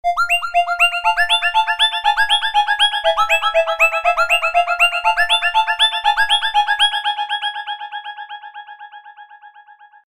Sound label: Spooky SMS